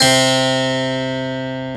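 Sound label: Harpsichord; CMI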